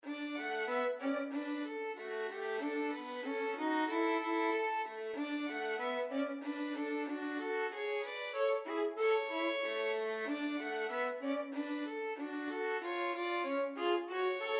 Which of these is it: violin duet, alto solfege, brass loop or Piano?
violin duet